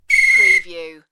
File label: Ref Whistle Shrt